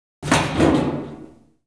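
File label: door metal close; The Elder Scrolls III - Morrowind; Morrowind GOTY GOG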